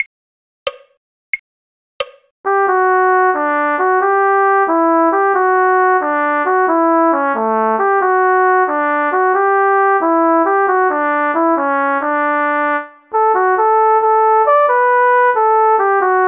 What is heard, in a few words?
cornet; the keel row; folk